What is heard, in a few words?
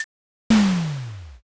grenade